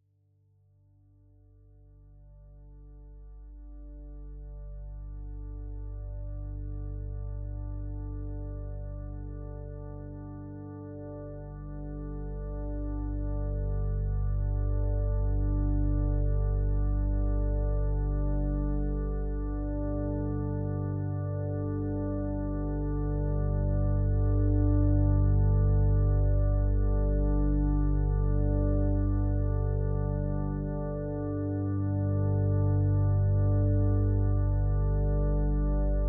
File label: sleep wind down